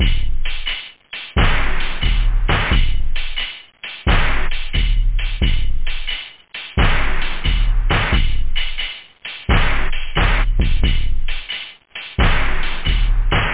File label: tom, loop house; da capo vol1; instruments